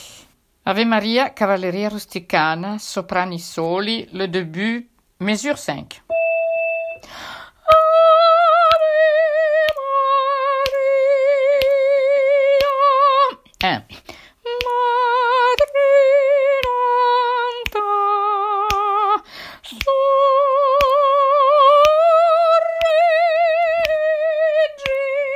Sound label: ave maria sop; Ave Maria Mascagni